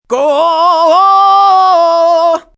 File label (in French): chant; Mobiclic51